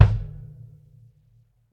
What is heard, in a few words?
perfect bass drum one shot g sharp key